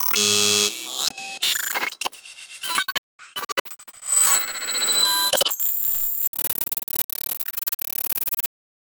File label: glitches futuristic